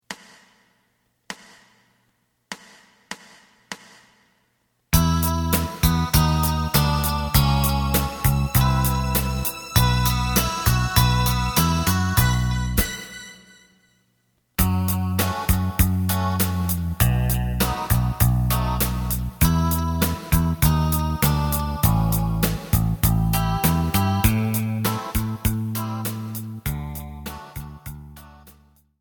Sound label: lead